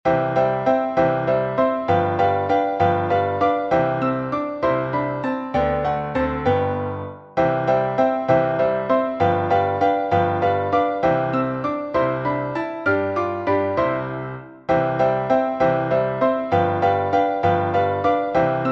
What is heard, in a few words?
duettino for piano hands; vanhal johann baptist